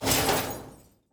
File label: Metal Foley; Sci-Fi Sounds